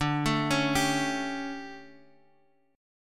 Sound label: D Major 7th Suspended 4th Guitar DADGAD, chord